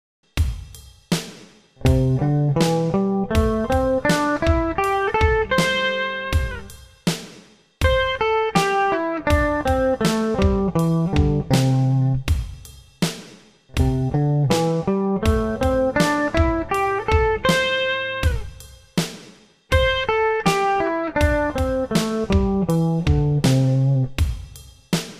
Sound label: C maj pen 8th ex